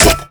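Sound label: blade hit robot; melee impacts; Garry's Mod; tf2; mvm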